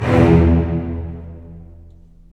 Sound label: STR, STR Full Short; Roland - LA Composer vol. 4